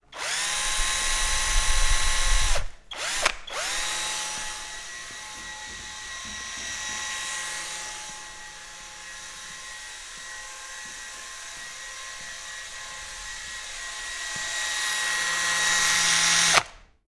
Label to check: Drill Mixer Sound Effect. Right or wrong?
Right